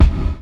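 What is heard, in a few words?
gated bass drum, Drums Acoustic; USB Soundscan vol.10; AKAI; 90s sample CD; GATEKIT 3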